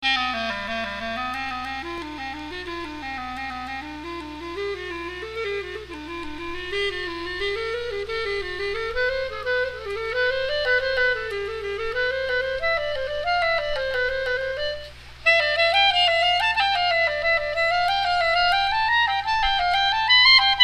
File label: Clarinet; Figaro